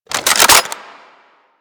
Double Barrel Shotgun Reload Close; Warframe; Tenno

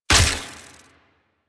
Shot, Weapons; Warframe; Infested; Derelict Cernos Prime

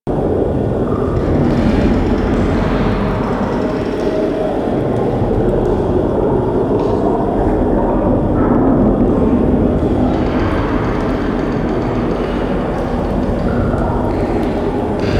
Forest Interior Water Tunnel; Warframe; Ambience; Grineer Forest

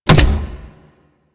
Step Default

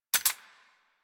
Emplacement Mech; Grineer

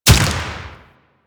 Deathshot Default, Bullet Impacts; Warframe; Weapons

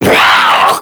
Infested Ancient Pain; Enemies; Warframe; Puss Ancient

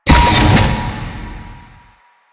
Mall Sliding Door Close; Warframe; Nineteen Ninety Nine; Doors

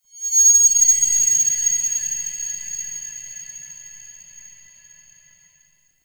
High Shing; Warframe; Ambience; Lore

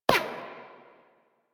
Weapon Hit Effect Metal

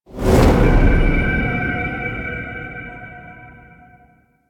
Whoosh; Drifter Lotus End

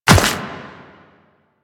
Run Dirt, footsteps

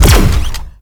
Laser Weapon; Laser Weapons

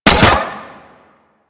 Space Drone Bomb Fire, weapons; Grn Space Drone

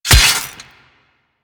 Melee Axe Impact Robotic Flesh; Warframe; Weapons; Axes

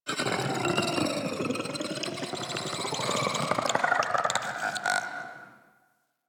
Alchemy Lab Create Vocals; Warframe; Hub; Liset Alchemy Lab

